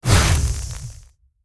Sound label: Chili Used; Ui Combat Chili Used